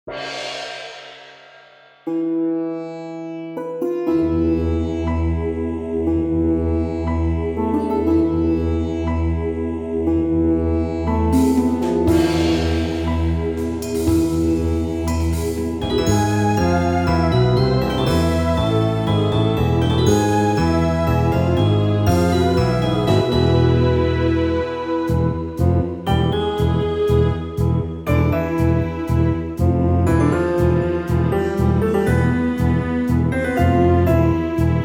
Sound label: Himalayan Beat